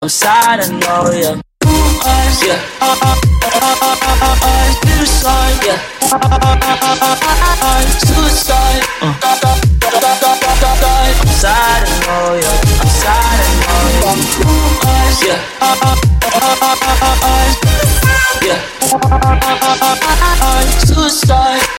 sad remix

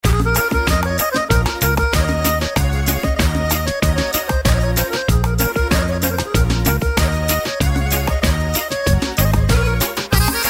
Turbo Folk

